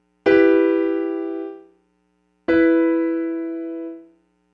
Chord Interval

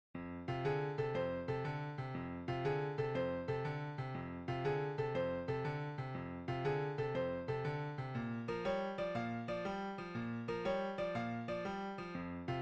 Simple Blues In F; 16Blues

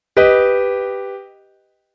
G Augmented Triad

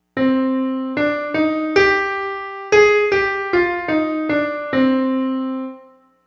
Simple Duple

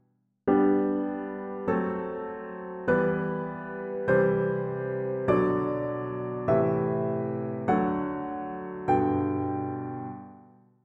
chords

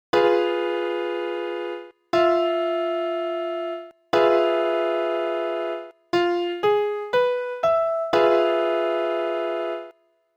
Dim Maj7

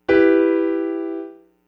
Block E Minor

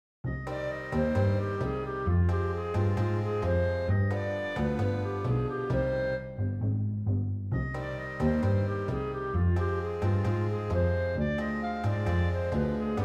Tango Trio

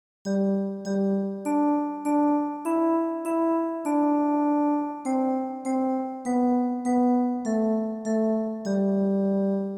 Twinkle

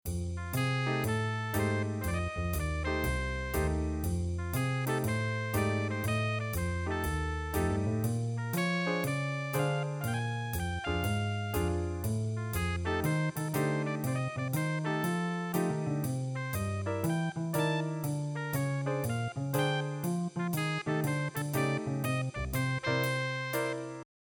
Simple Blues In F; 16Blues

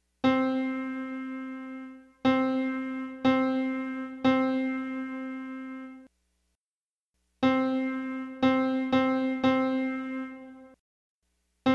rhythm

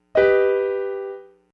G Aug

